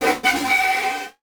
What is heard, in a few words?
Transition Feedback Warble; Production Element